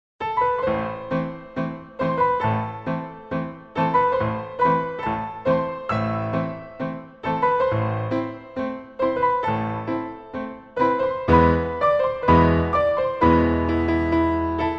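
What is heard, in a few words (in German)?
Türkischer Marsch 4hdg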